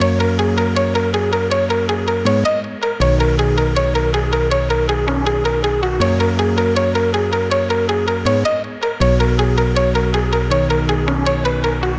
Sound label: Melody Loop - 160 BPM F# Maj, Synth Loops; Cymatics; Light Free Sample Pack; Bonus Samples